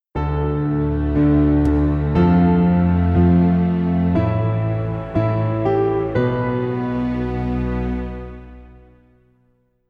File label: sting